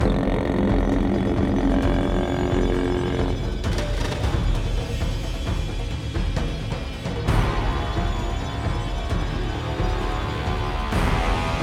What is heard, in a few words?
Fight Theme Tension; ryse son of rome 2014